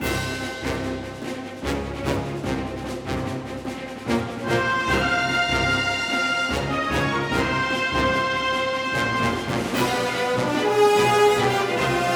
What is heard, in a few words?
BATTLE NORMAL LOOP 146bpm, Cinematic; Stand Your Ground; SAGA Complete Collection; SAGA III - Cinematic Normal & Boss Battles